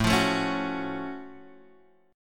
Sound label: Gb Minor Major 7th A